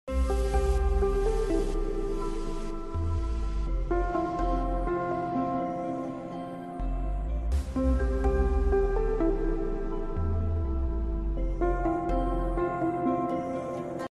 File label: song bgm ringtone instrumental bgm ringtone